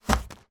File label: household rolled up plastic floor mat put down